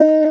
Noises Vocal Shot

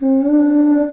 Vox (Cry); AngelicVibes; Waves

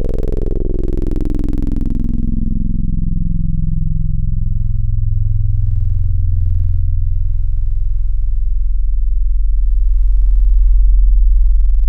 reso filter; Synthetic's Serum Collection; Chill Trap Tables

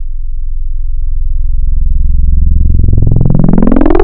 303 Acid; Synthetic's Serum Collection; Cymatics - 303 Acid for Serum Vol 2 Wavetables

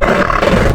Horse; Synthetic's Serum Collection; Cymatics - Animals for Serum - Gold Edition Wavetables

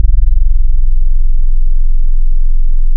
Digital Chill Sines; Synthetic's Serum Collection; Echo Sound Works Core Tables

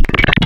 Myotis, animals; Synthetic's Serum Collection; Cymatics - Animals for Serum - Gold Edition Wavetables

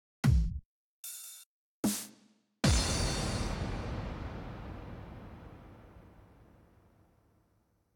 Sampling Rhythm One Shot; Running Cost

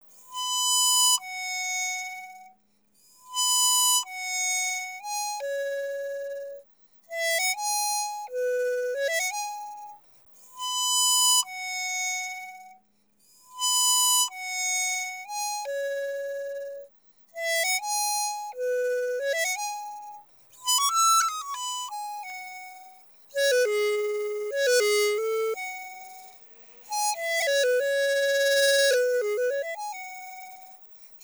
sax

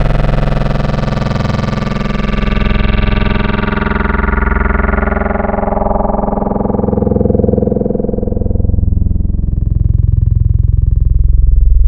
Digital complex saw; Synthetic's Serum Collection; ESW Core Wavetables; Echo Sound Works Core Tables

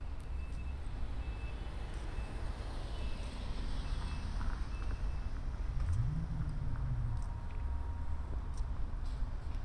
Truck Backing Up; Hilltop Plaza And A Gas Station At Dusk

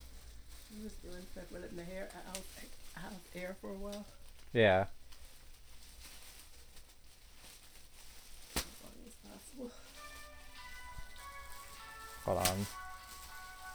Grandma Messing With Plastic Bags & My Phone; Ringing; Working With My Grandma 4.29.2016

